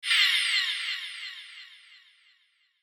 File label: WHOOSH SPACEY, Whoosh Spacey Delay; Blastwave